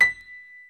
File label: B5 PIA; Best Service Gigapack 1